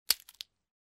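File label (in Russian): одиночный лёгкий хруст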